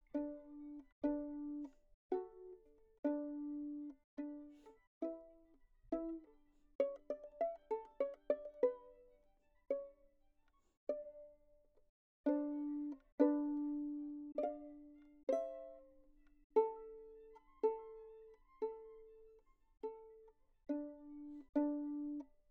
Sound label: Trio Violin2 String Quartet; Haydn; op76 n1